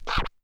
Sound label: Small Cut, Vinyl Record Samples, Cuts